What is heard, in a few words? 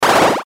Flash Animation Multimedia; Web Interactive; Button Sound Effects; Blastwave; Technology